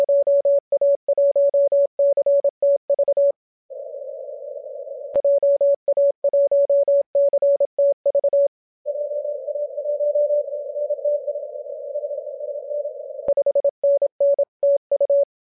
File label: 18MHz